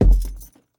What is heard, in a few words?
Footsteps Wood Walk; The Town